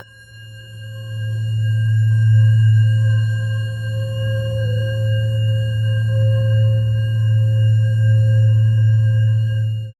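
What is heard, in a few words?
a single deep tubular bell, reverse swell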